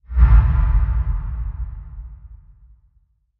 Bass Impact Dreamy

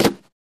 Cardboard Puncture; paper mvmt

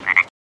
Frog Croak Single

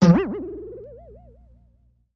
Bing, metallic, insecty, vibrato up; bings

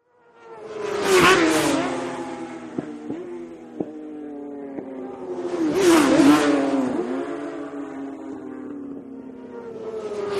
Motorcycle Road Racing

